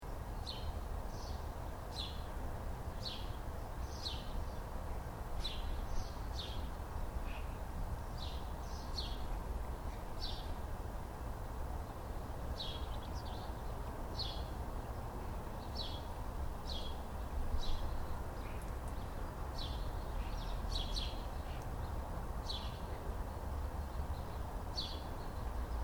Garden (Residential): Ambience, Birds, Slight Road In Background; Outdoors; Backgrounds; Outdoor Sound Effects; Stuart Duffield